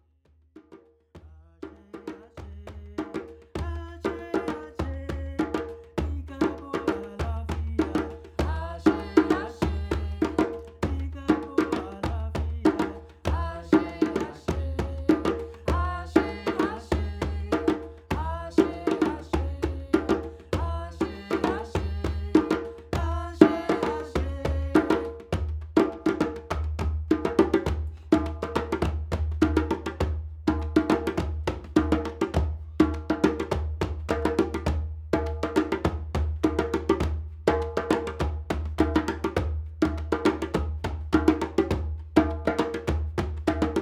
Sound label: Djembe duo; Fanga